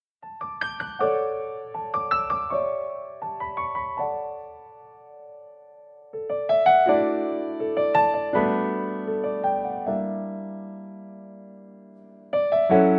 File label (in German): StudioChor; Childrens Corner StudioChor